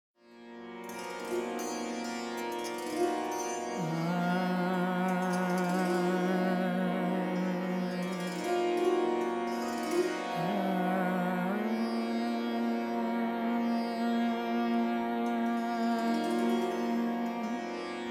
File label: raga gunkali alap